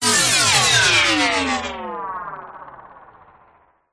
science fiction robot power down die or switch off metallic mechanical; zapsplat